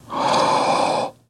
Werewolf Breath, Airy, Low Short

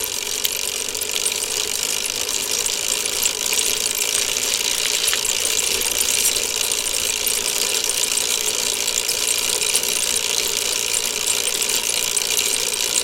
Mt Bike Pedal Loop, bicycles

